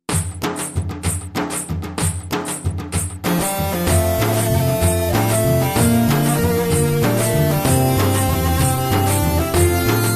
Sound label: Tamil Sunday School Song